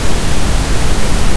waterfall; q3rally; world

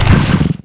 pl fall pain; player

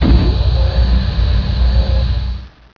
drone, ambience; ParoxysmII

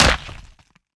hit

